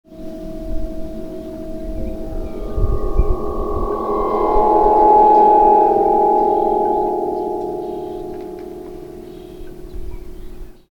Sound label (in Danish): vindharpe